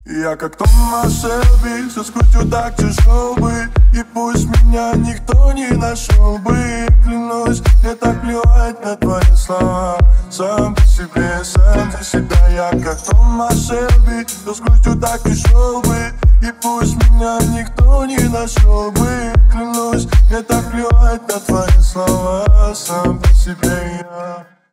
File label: remix; tomas shelbi